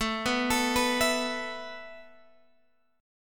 A Suspended 2nd A Guitar Open G

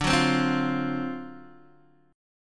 D 7th Sharp 9th, Guitar, DADGAD

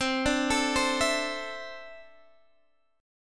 E 7th Suspended 4th Sharp 5th C

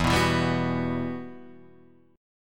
E Minor Add 9th; madd9:1,b3,(5),9

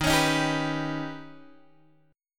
Eb Augmented 7th Eb down Guitar Open G

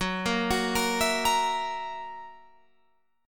F sharp 7th, Guitar, Open F; arpeggiated; F#7; 18,17,18,17,19,17

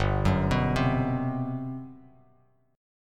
G Major 11th, Bass Standard; arpeggiated; JGuitar

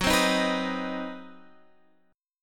C Minor Major 7th Flat 5th F sharp, chord

